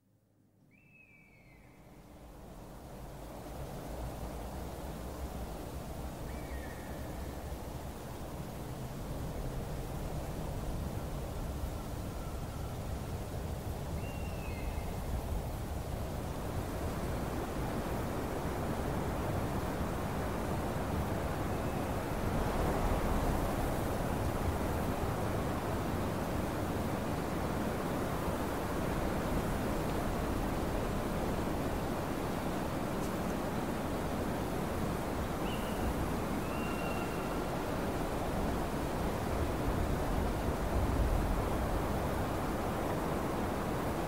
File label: Mountain Sound effect with distant Eagles screaming